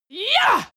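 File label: female combat attack short, screams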